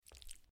Sticky; Granny Chapter Two

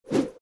Twirl; Fancy Pants World 1 Remix

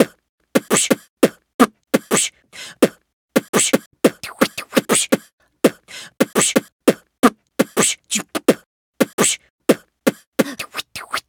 beatbox loop; OK K.O. Lets Play Heroes

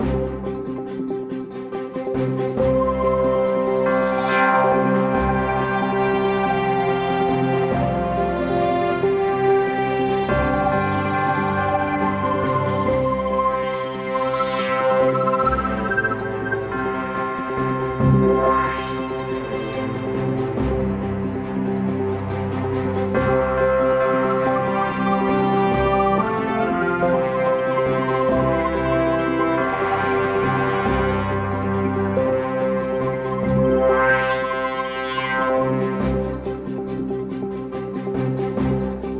Player Winning

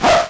dog sfx

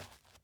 dirt step

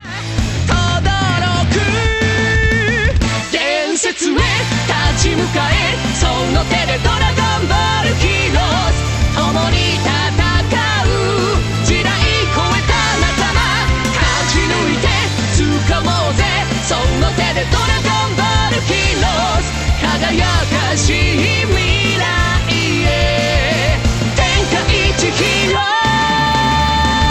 loop

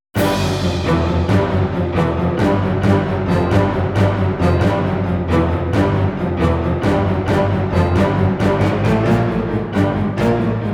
Tense; Hayari Gami Original Soundtrack

